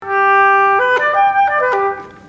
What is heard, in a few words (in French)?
hautbois